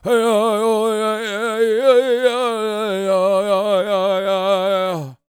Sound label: World Voices, CHOCTAW; Spectrasonics Vocal Planet CD5